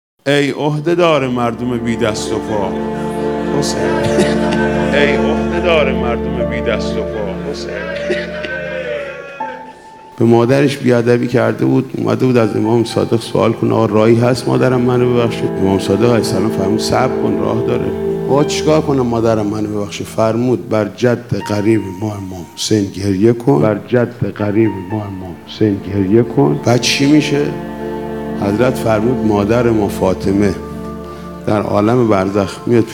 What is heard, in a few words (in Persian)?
نوحه